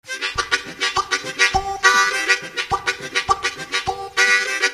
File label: Effects, harmonica